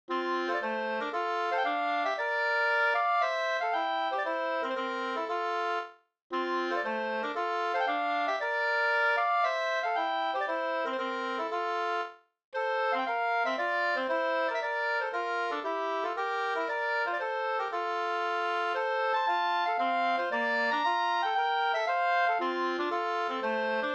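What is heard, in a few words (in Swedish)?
polska, tvåstämmig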